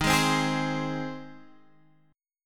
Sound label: C Minor Sharp 5th